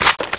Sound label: clip out, weapons; codmod; tmp